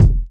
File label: phat kickdrum sound f key